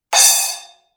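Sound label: splash, cymbal; Sabian 10 AAX Splash Brilliant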